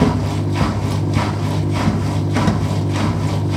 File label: Washing Machine Rinse Loop